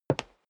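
step dress boots wood parquet single right, footsteps; schritte; menschen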